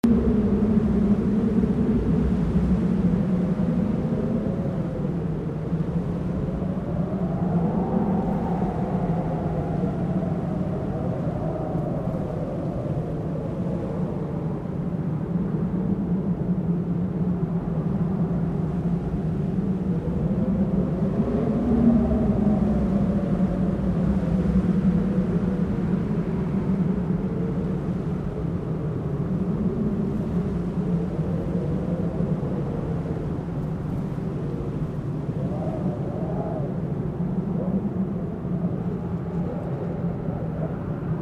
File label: WIND Interior Moan Draft; gamerip; The Unfinished Swan